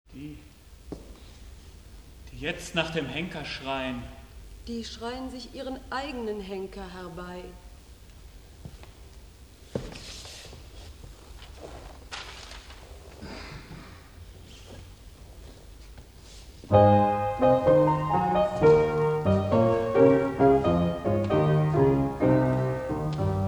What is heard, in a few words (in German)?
Klaviere Kraft